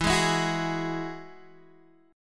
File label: E Major Add 9th, Guitar Open G